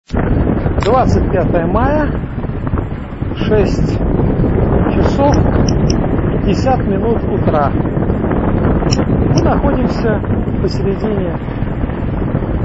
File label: indonesia sounds; geopoesia